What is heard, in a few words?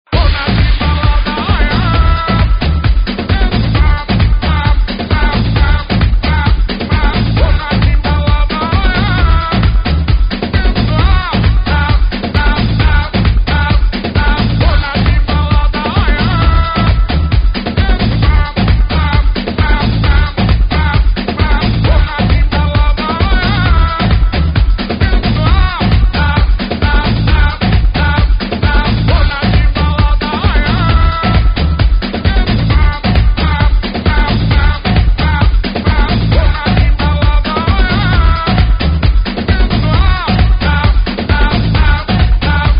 kick; multitrax